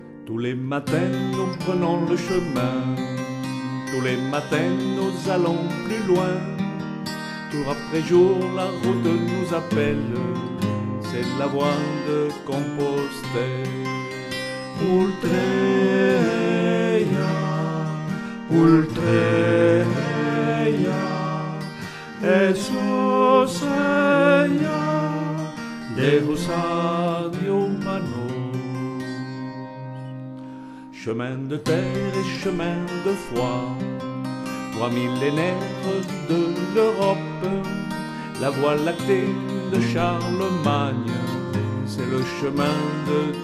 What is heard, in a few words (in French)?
Ultreia chant et guitare